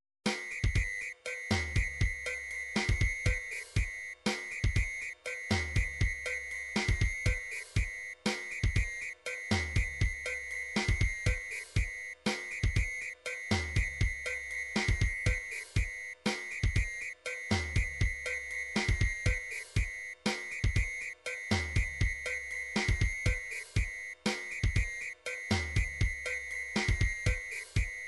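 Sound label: drums pattern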